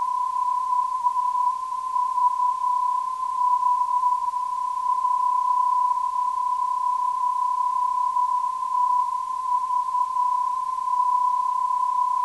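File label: negative keying, non-directional beacon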